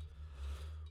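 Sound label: vocal; Pro Tools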